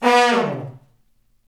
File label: BRS, Fat Falls; Roland L-CDX-03